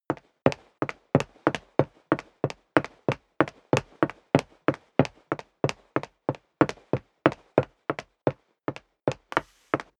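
step dress boots wood parquet run sequence, footsteps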